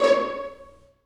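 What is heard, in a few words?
1st violins pizzicato C#5; Sonatina Symphonic Orchestra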